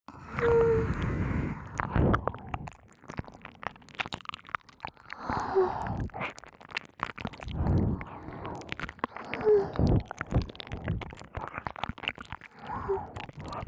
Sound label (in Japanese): 反転 耳舐めループ, ASMR; 無知なフリして親戚のお姉ちゃん達に悪戯し放題♪→バレてお仕置き!枯れるまでお射精させられる。